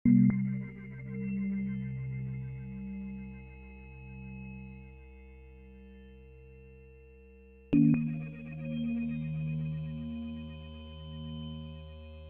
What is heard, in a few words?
Rain Drop